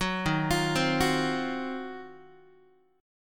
Eb Minor 6th Add 9th Eb down Guitar 4A,4E,5A,5D,5G sharp,6C sharp; arpeggiated